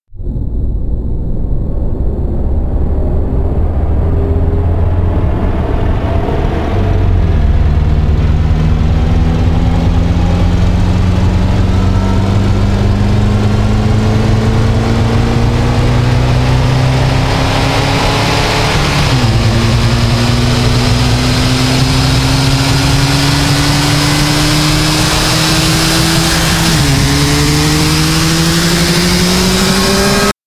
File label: riser gear shift